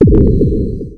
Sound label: haste